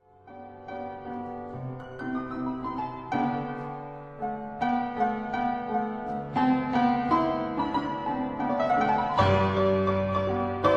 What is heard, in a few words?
Keyboard Sonata in E major, Introduzione Largo assai; Amicitia; Op. 69; Andantino